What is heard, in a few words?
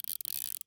toy glasses wind